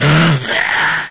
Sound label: alert, zombie; mistake coop